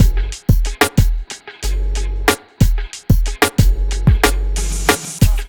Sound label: BEAT, 92, Hip Hop R n B; USB Soundscan vol.19; AKAI; TIMBA